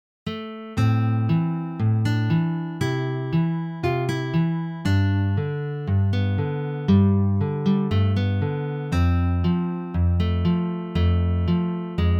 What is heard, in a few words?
Greensleeves, picking; Hörbeispiele Fingerpicking